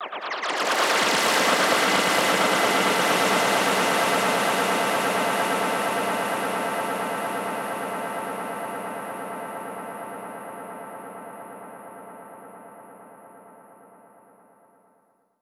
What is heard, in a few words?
Lazers